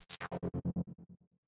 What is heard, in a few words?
laser; Hearts & Handguns Edition